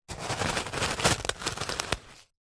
stones small wood batton dig in; foley

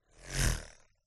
fantasy fairy fly past wings flap fast

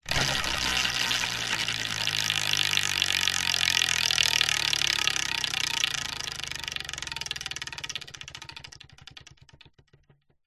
leisure wheel of fortune wooden spin

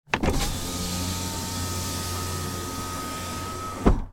van window electric down iveco

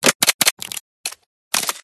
Detailed Mechanical Morphs Mutations; PMSFX

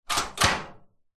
hospital door metal security close

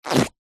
cartoon squeeze pull squeak rubbery squelch

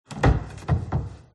open garbage; fox audio

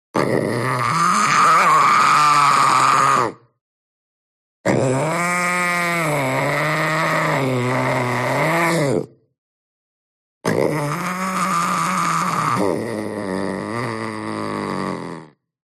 PANTHER Young Cry Long; ANIMAL; smartsound

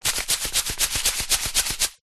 seeds packet black chia shake

